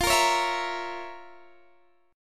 F Major 7th Suspended 4th Sharp 5th F, Guitar Standard; FM7sus4#5 strummed; JGuitar